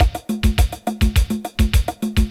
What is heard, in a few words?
Roland D 20 Samba 104 bpm